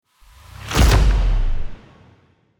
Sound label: Epic Hit; افکت صدای ضربه سینمایی